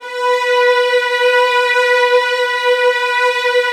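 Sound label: violin; Virtuoso Strings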